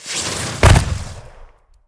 flame explo

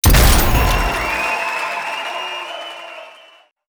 bang; emperor rap

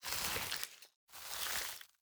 knead dough

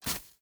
firespear

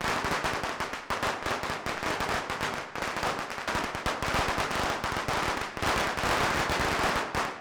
firecracker loop

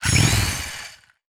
atk sharpsaw, player

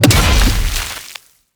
skill axe

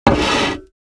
push plate

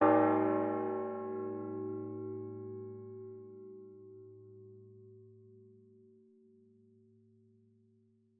big bell

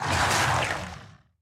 bite

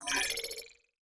load message board

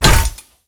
imp spanner

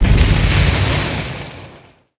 imp jump fire

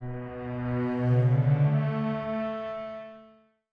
cello doubt

